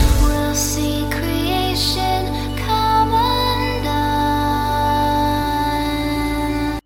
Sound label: sea of voices